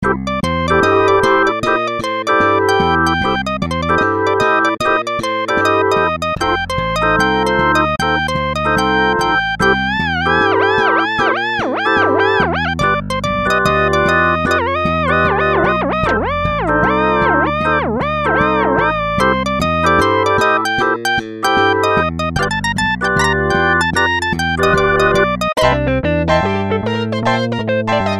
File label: MIDI blues